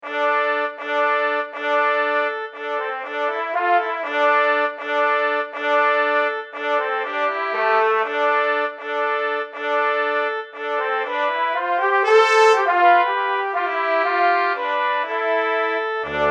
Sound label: brass